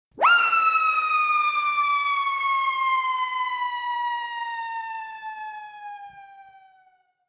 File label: whistle; dutch; taunts